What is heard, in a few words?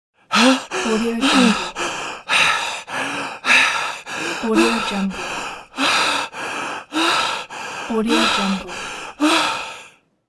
heavy breathing; human sounds